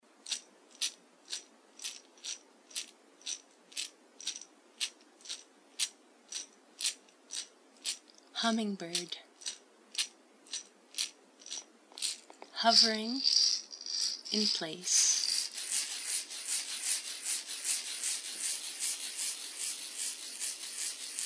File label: hummingbird flute haiku